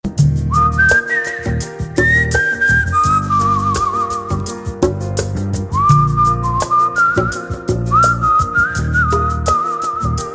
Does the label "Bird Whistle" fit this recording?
Yes